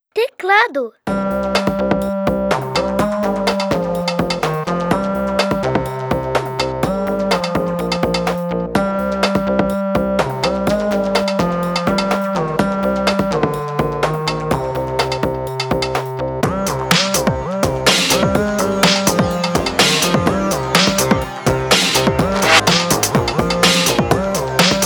TECLADO

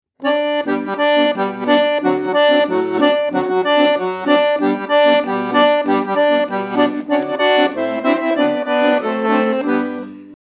Som da Sanfona

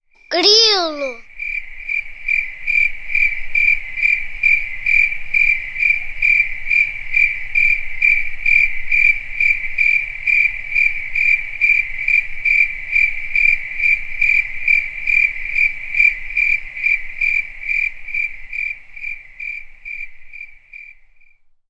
Grilo

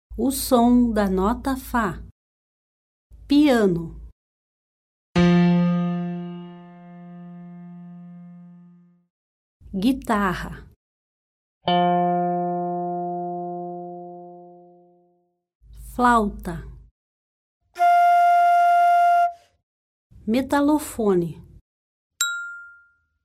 O SOM DA NOTA FA

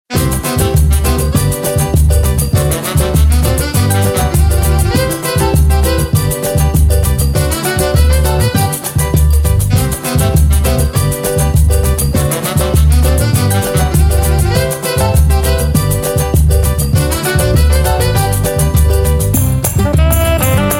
PAGODE

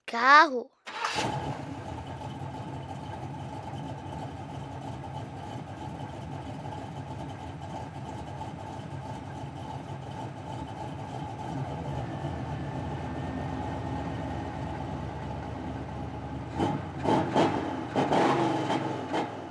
CARRO

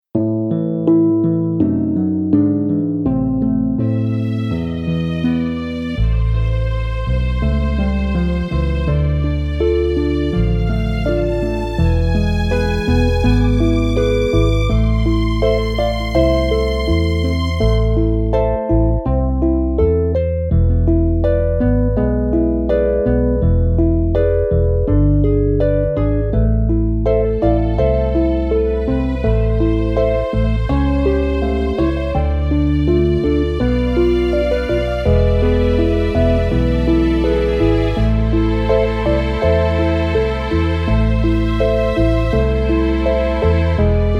NESTA RUA - HARMONIA

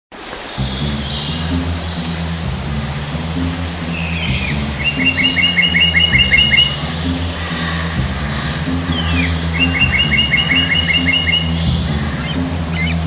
SONS DA NATUREZA

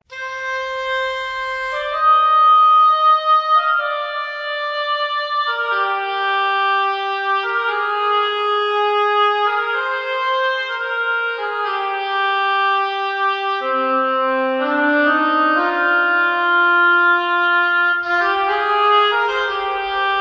OBOE

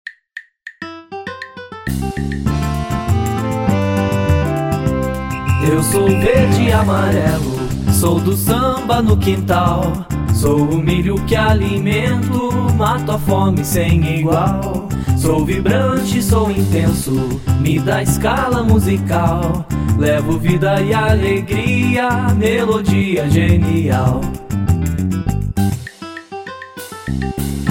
SAMBA

